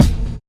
kick sound F sharp key, Royality free bass drum single shot tuned to the F# note. Loudest frequency: 515Hz; kick drum samples; one shots